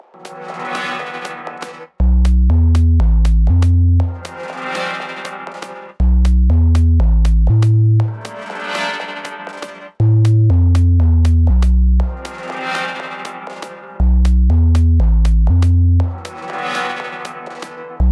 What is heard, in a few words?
panic